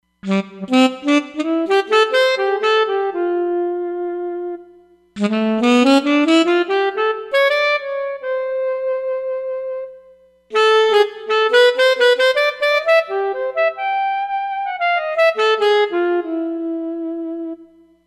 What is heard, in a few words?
Alto mp, Roland Fantom XR